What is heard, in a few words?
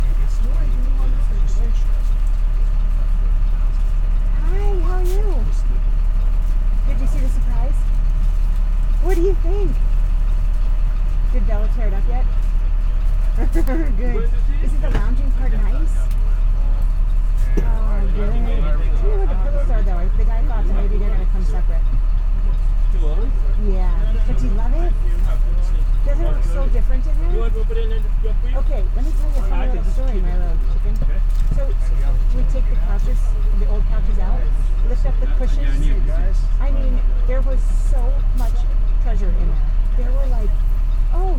bus NY